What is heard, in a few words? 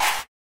Chants, Vox